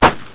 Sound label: CRACKER, 爆炸